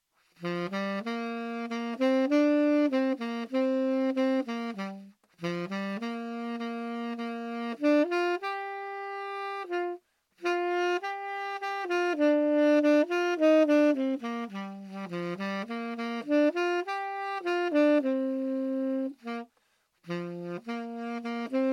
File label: loch lomond tempo tenor, saxophone